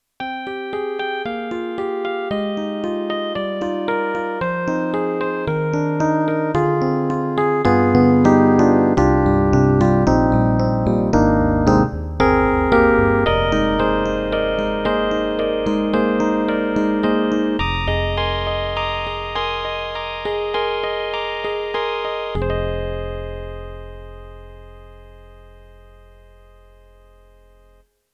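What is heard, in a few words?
YAMAHA MU1000EX Hard Electric Piano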